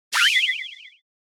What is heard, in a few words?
Text letter reveal intro anime